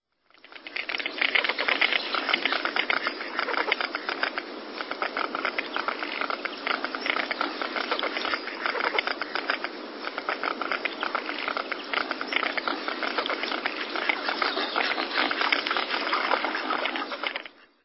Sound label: Cinnamon Teal; Birds